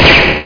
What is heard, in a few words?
blast